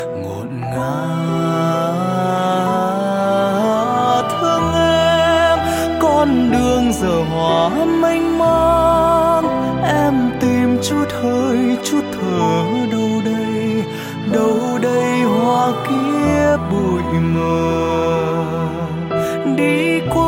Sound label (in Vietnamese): Nhac Chuong; nhac tre